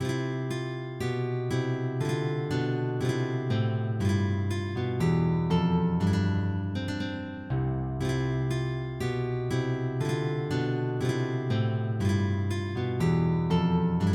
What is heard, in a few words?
Gavotte de Mouton, MIDI